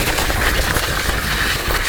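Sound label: grind banyan tree; terrains; Tony Hawk's Project 8; XBOX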